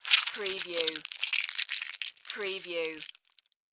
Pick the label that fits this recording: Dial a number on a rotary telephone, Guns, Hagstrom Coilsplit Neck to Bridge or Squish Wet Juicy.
Squish Wet Juicy